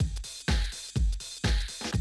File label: 125, Classic Drum Loops